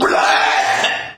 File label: dying bird; ff hunted